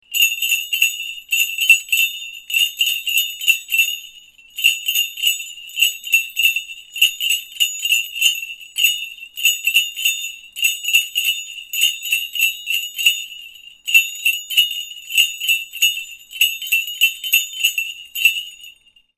christmas ringtone

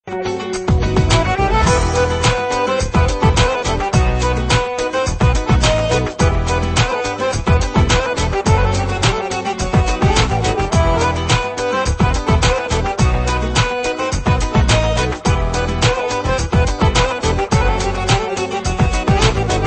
accordion

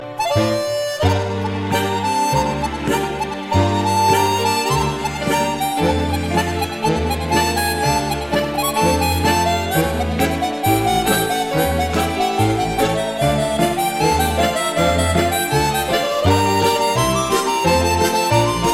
harmonika

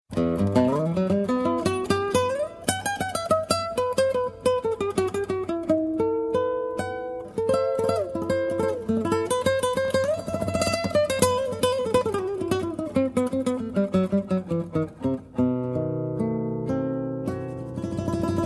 guitar intro; Latin

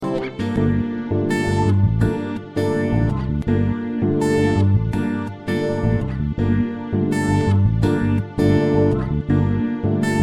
hot and nice tone; Jazz

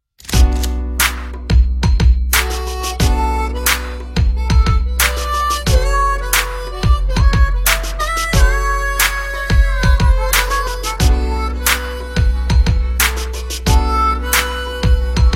harmonica rnb; R&B

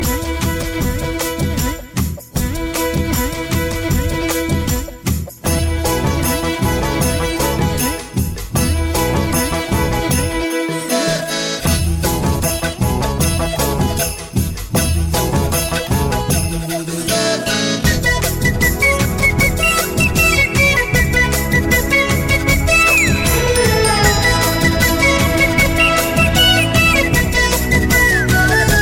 ascending tone; Indian

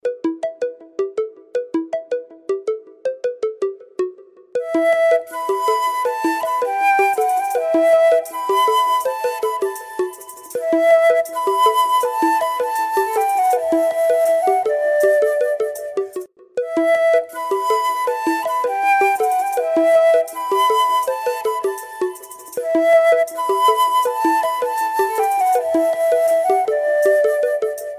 flute; Indian

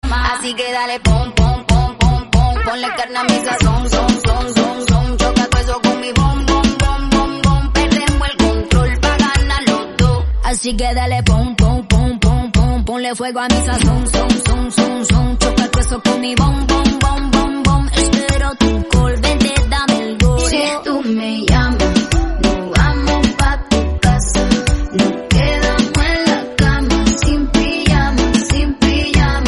spanish style

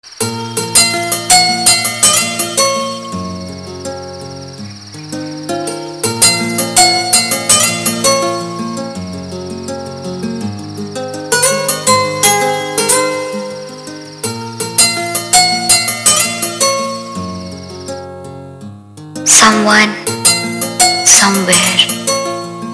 guitar tone; Paglu; Indian

